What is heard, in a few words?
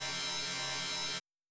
saw; Seasons-Of-Loneliness